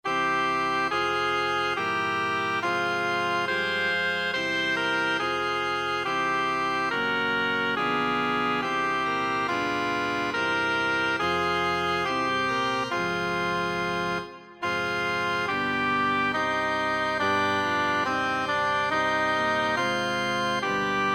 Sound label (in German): choral sopran; 06 choral; bach johann sebastian; kantate bwv 002 ach gott vom himmel